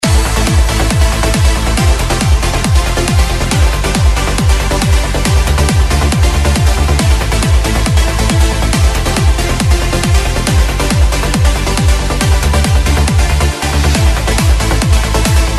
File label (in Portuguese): trilhas dance